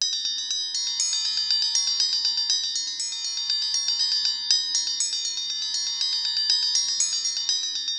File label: Ambient Bells, Percussion Loops